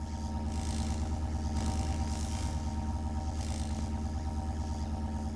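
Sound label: laser Wall loop; doors elevators; Star Wars Obi-Wan; XBOX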